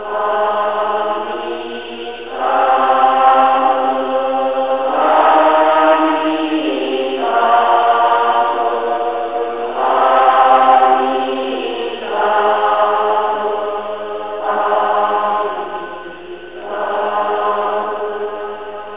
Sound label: Buddhist Chanting Namo Amitabha